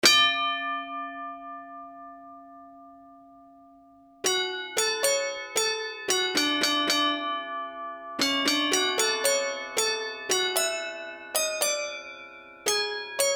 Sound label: tram glockenspiel